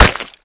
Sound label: Projectile Sounds; Red Orchestra Ostfront 41-45